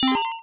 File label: MOVE; C64 Dreams; Multi-Sound Default